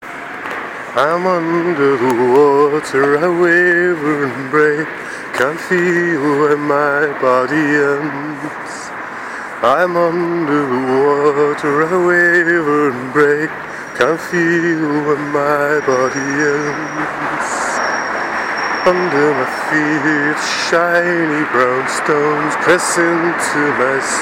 waver and break song sketch, Not brilliant singing, walking; Not brilliant singing. My only excuse is walking and reigning it in a bit.